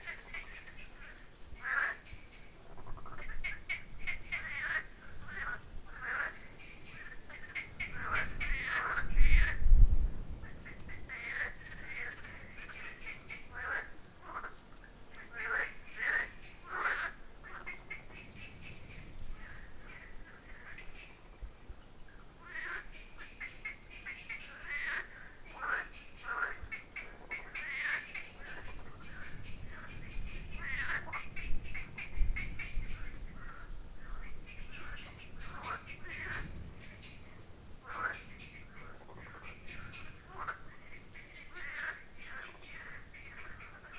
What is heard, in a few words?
frogs Kalamos